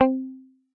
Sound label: Guitar Mute; Guitar Clean; Guitar and Bass; Ableton; Factory Packs